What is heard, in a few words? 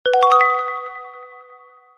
status notification